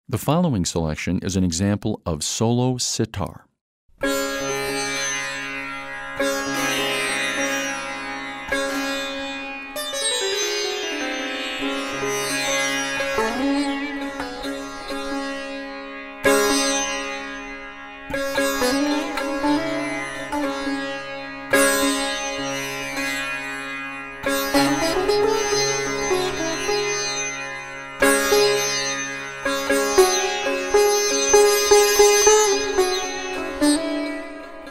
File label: Solo Sitar